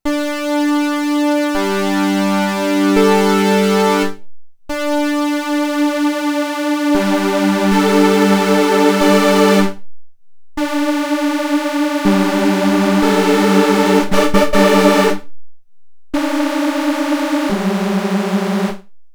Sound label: Sol Supersaw; Shape parameter to an extreme amount, which in this case changes the detuning factor; Solaris Supersaw #1